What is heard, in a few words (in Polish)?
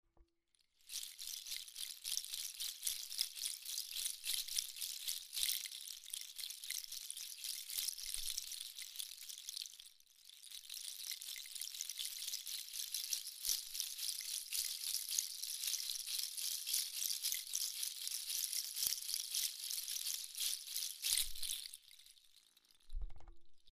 Cabalonga, Grzechotka z orzechow; delikatny, czarujacy, bardzo kojacy dzwiek